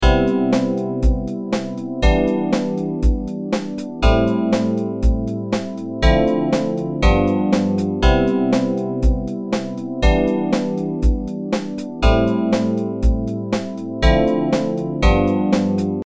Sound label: Cmaj7 Em7 Am7 Dm7 G7